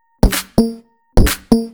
Electro Loop 128BPM; Vengeance Samples

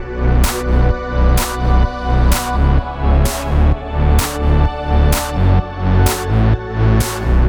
Special Sounds Root B 128 BPM

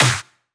Pre-Shifted Claps; Vengeance Samples

